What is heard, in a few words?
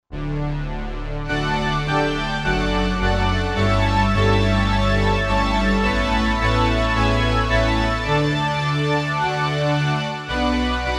JX-3P Pipes; Roland SR-JV80-04